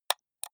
computer mouse left click single slow hard

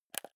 console controller joystick press fast

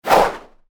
fight swish noisy fast

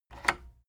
cassette eject push

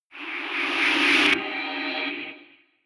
rise noise achievement

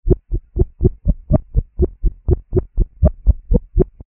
noise pulse

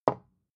bottle put down

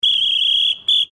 whistle

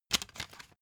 cd in cover fast

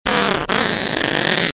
mad duck

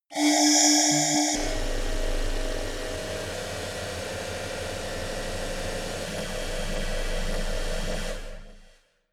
scanner

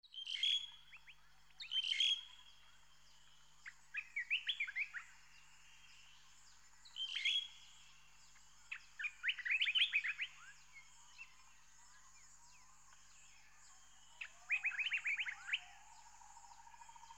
rain forest birds chatter

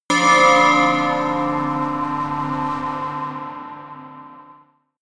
melodic church bell pad high

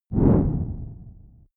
whoosh mid distorted

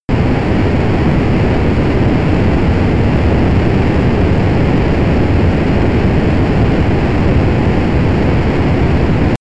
spaceshuttle rocket full power steady

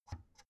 oven knob turn soft

